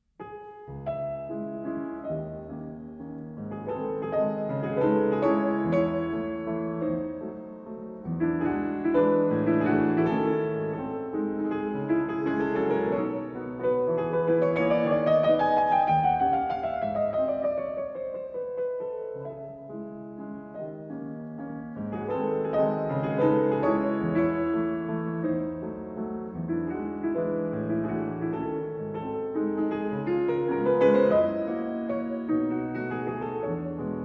Waltz No. 7 in C-Sharp Minor; Chopin; Op. 64 No. 2

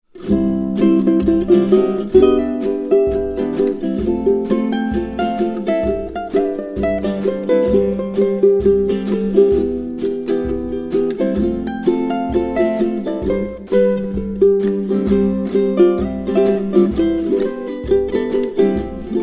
Healing Relaxing BGM Ukulele Harmony

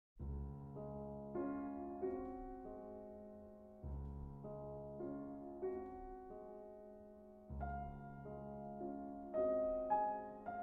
Berceuse in D-Flat Major

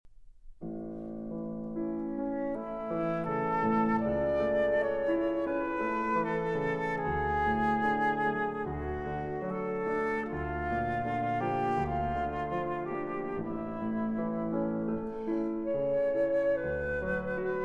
Lieder, for Flute & Piano; 5 Lieder, Op. 105; No. 1, Wie Melodien zieht es mir

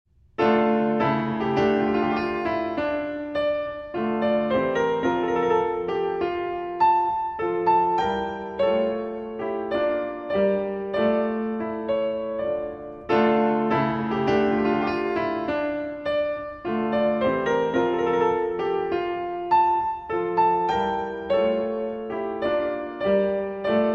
Chaconne and Fugue in D Minor, For Piano; Bach, JS; BWV 1178; Transcr. Pontier

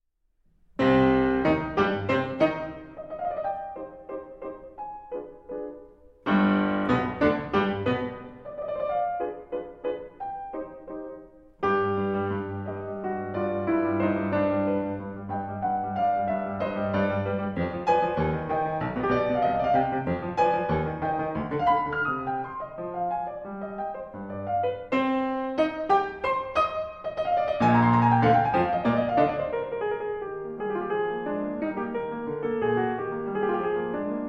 Piano Sonatas in C Minor Molto Allegro

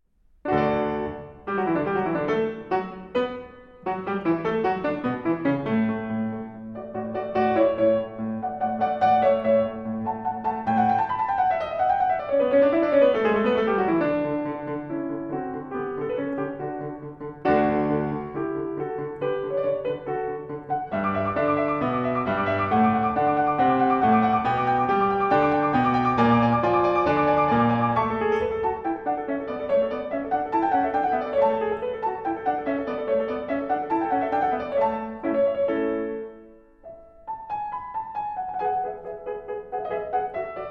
Piano Sonatas in D Major Allegro

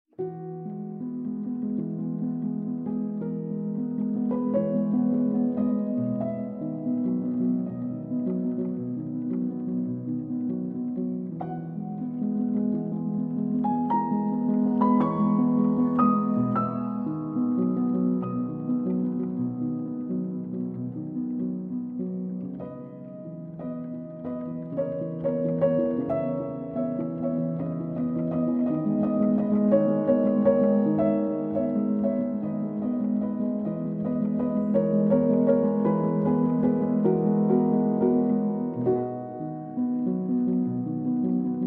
Performed on Piano

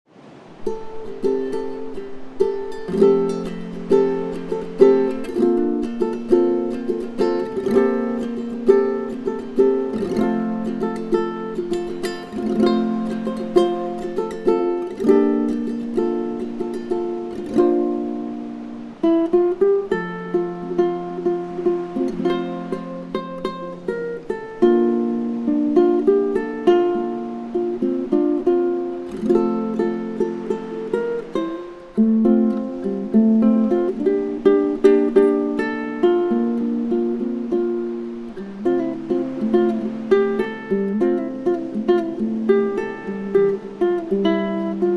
Healing Relaxing BGM Hawaiian Ukulele

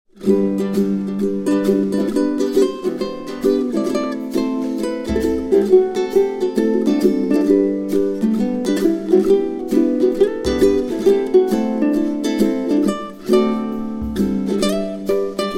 Beach Cafe Hawaiian Tune

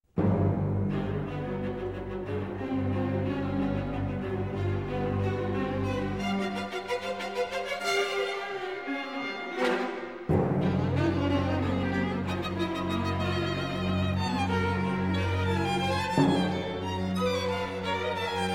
Partita For Violin (Version with Orchestra); Lutoslawski

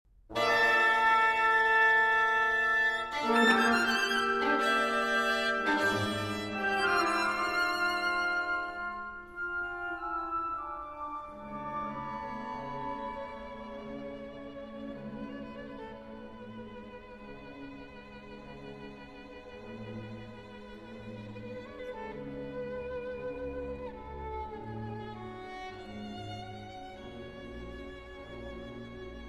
Violin Concerto; K53; Stravinsky; III. Aria II

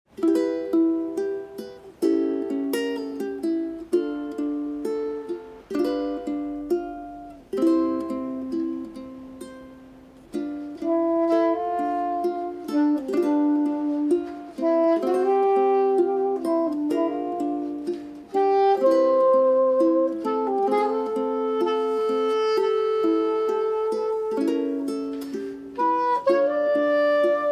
Relaxing Cafe Music BGM Ukulele Sea Cafe BGM

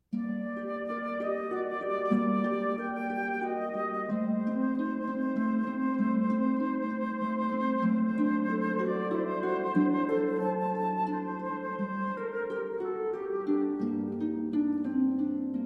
Flute Sonata in E-Flat Major, for flute and harp; Siciliano; BWV 1031 II.; Arr. D. Sosin

